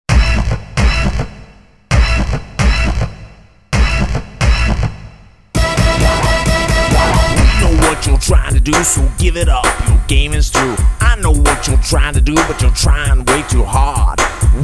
Your Game Iz Through (vocals); Soundzabound Volume 3

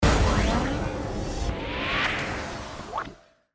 Burst Flutter

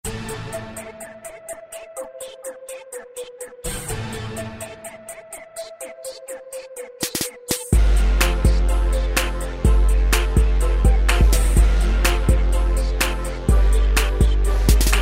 Krunktronica

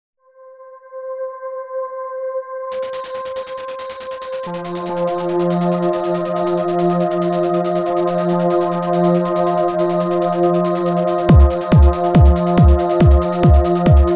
Trance; Soundzabound Volume 5

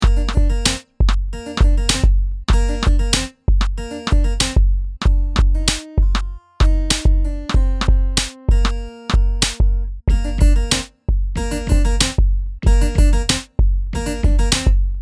Piano Vibe

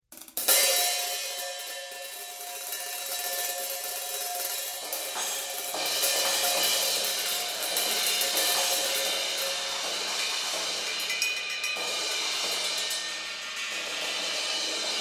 Drum Set Solo

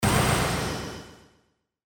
Burst Reverb

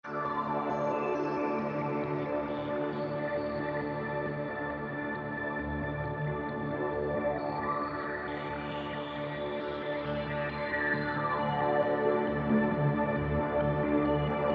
Pulse Spacey

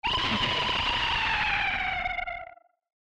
ghost scream

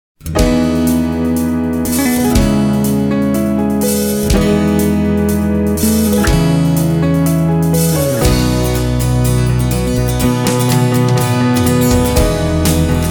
Happy Rhythm; Soundzabound Volume 4

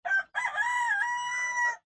rooster cock a doodle do

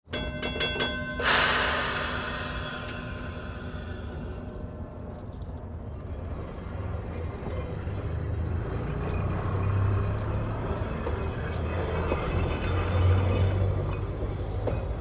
Trolley Departing

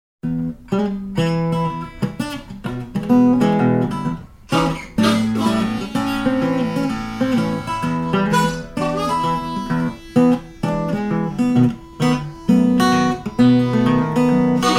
Delta Blues; Soundzabound Volume 3 Disc 1

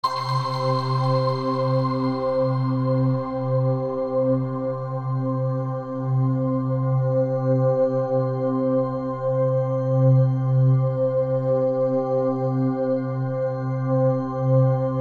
Soothing Harmonics

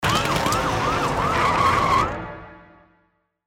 Burst Chase

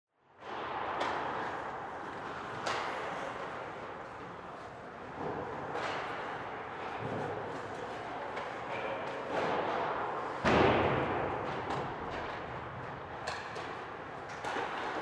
hockey ambient game

